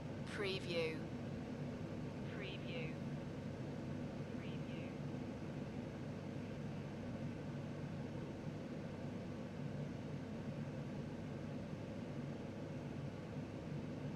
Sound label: Kitchen Extractor Fan Loop; beanstalk audio